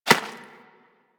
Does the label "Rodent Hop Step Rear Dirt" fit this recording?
Yes